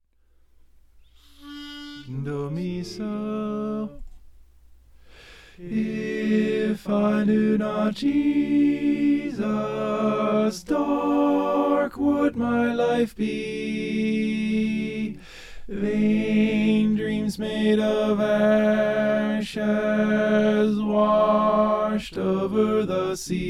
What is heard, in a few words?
tenor